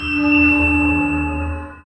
cosmic

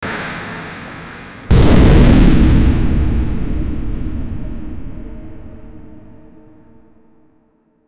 rising rumble, bass boost

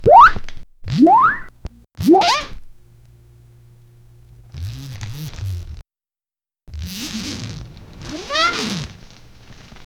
fun sound zip opens, bag

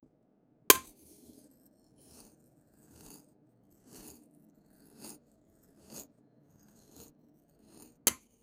a sound of a knife

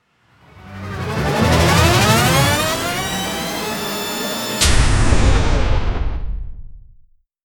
short fps round start sou

